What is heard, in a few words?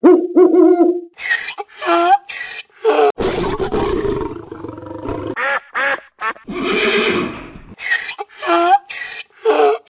string